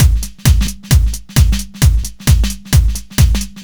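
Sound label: 132 No Snare, Drum Loops; Zero-G; Soundclash Nu Dance Electro; Acidized Wavs; 132bpm; Plastic Man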